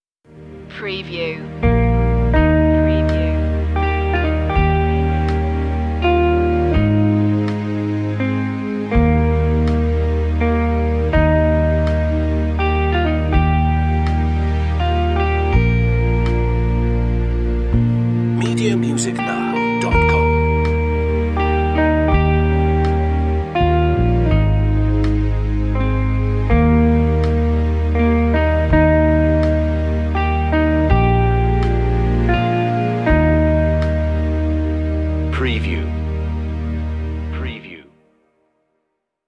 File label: amazing grace brisk verse 82bpm